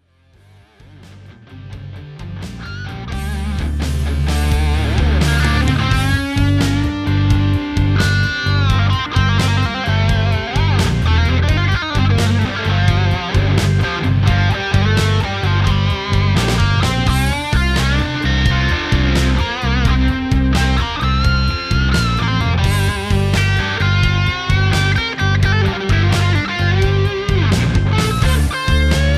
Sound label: Blues